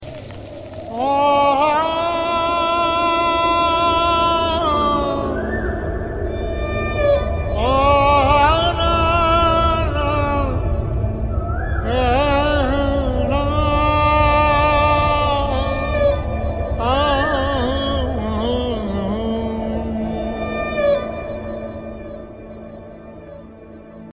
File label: Sad Tone